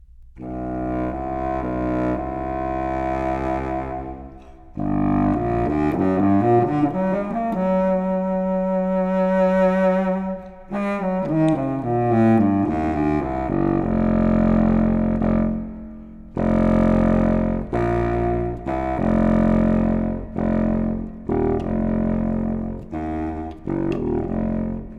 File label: Slow, funky blues